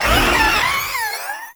Maggot Attack; Warframe; Proto Infested

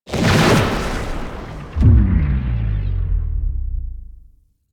Alt Transference; Quincy; Warframe; NineteenNinetyNine; Stage12PartyOfALifetime

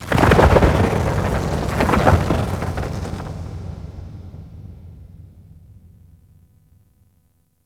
Thunder Large; Warframe; Ambience; Cephalon

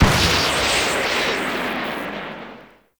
Lightning Machine Strike; Ambience; Props; Warframe; Entrati

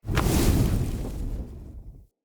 Torch Puzzle Fire Ignite

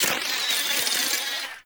Cave Hi Tech Clicks; Warframe; Ambience; Stalker Cave

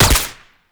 Pistol Shot; Warframe; Weapons; Tenno; Lasrian

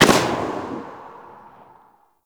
Shot Tail; Warframe; Weapons; Afuris

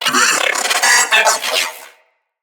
Riot Moa Vocal Attack

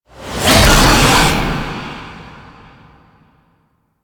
Struggle End; Warframe; The New War; Archon; Boreal

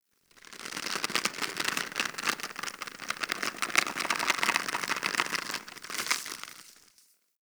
Tendon Stretch Large Close; Ambience; Warframe; Infested Eris

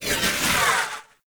Osprey Dodge; Warframe; Corpus

